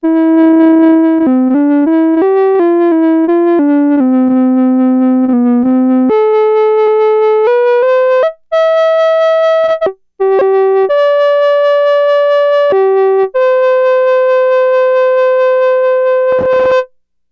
Flute, Roland SH-2000

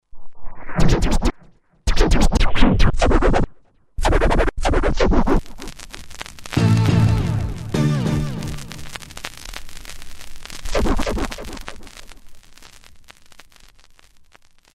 DJ stuff, Hip Hop; Roland SR-JV80-12 Hip Hop Collection

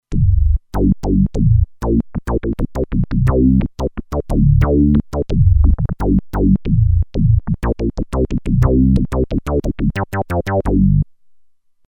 Reso Clk Bss, Roland Alpha Juno-2; Factory 2

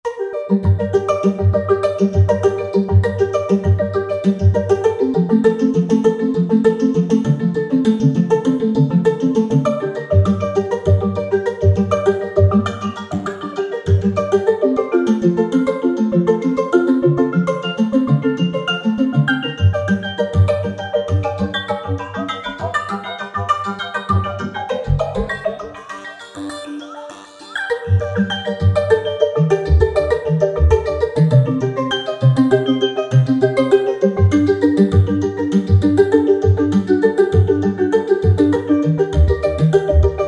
Harp, Roland V-Synth; Factory Patches; Harp Trek

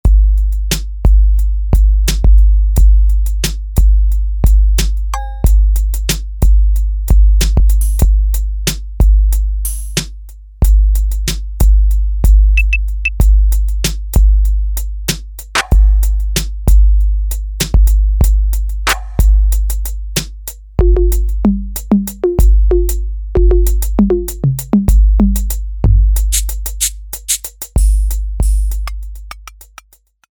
Beat Box, E-mu Pro-Cussion; Factory ROM kits

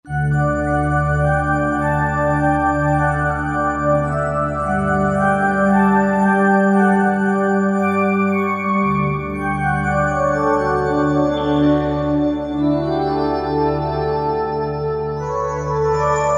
FX Bell Pad, Roland JV-2080

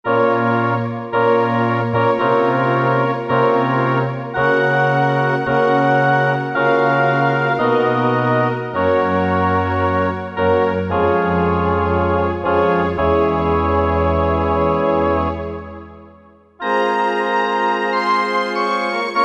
Orchestra, Korg MSC-4S; Korg MSC-4S Orchestra 1

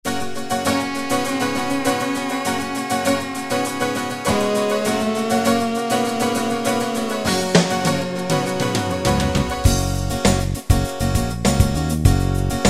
College Rock; Preset Styles

